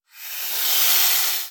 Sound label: Rattle Snake